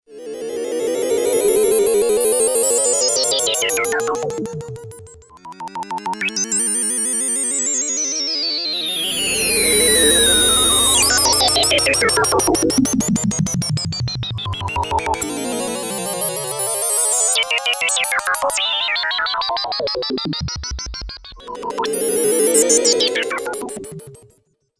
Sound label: DISINTEGRATOR, Korg DW-8000; Factory Patches